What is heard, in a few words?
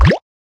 ui button; attackonball